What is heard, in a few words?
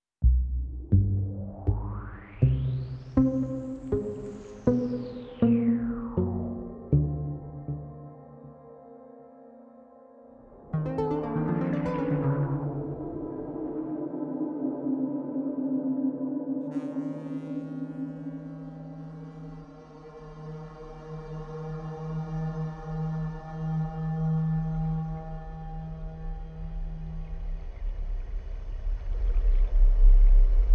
Sound Waves